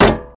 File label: Impact Metal Hits